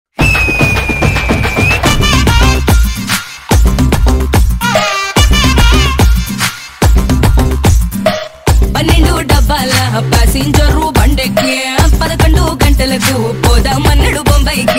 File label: Dance Punjabi Ringtone